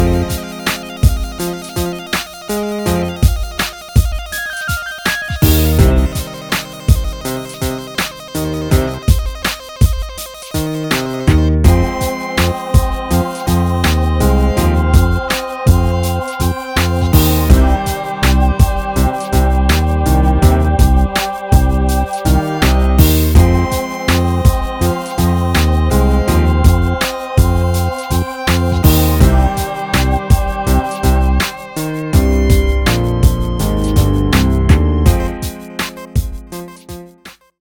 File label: Strangers Melody